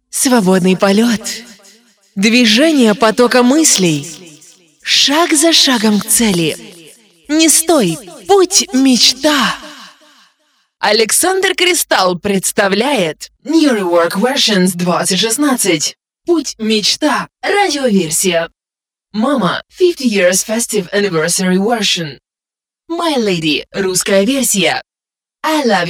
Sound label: New Year Jingle Echo FX